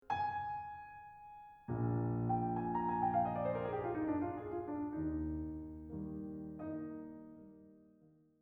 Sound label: Bluethner; Beethoven Pathetique Mvt 1